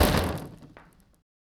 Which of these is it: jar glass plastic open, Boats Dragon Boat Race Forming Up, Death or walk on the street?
Death